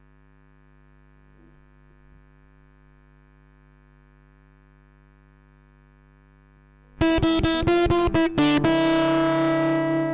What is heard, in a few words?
Lead Guitar 1st verse 1st Chorus; REMIX KIT